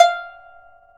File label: PIPA F4; INIS; Opium